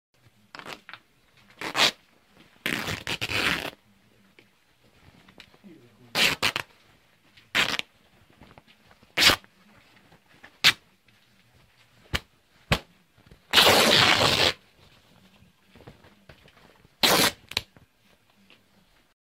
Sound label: Torn cloth; افکت صوتی